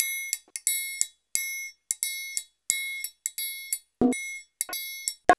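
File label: TRIAN, triangle, Modern Percussion Loops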